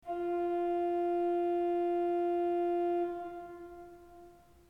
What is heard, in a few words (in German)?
ton, orgel